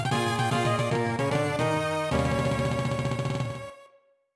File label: Lose Life; Donkey Kong Country 2- Diddy's Kong Quest